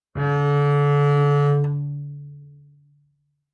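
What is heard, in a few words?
Bass arco fortissimo sul G D3, Double Bass; MIS Pitches - 2014; Strings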